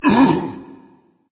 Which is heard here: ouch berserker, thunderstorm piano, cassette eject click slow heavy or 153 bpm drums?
ouch berserker